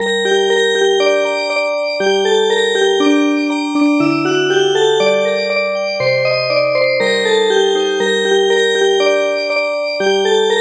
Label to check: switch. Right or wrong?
Wrong